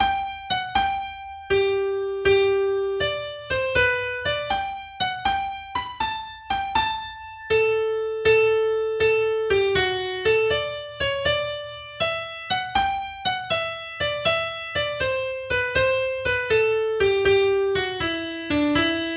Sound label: slow Hornpipe; College Hornpipe